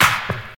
crisp steel snare drum f key, snare single hit tuned to the F note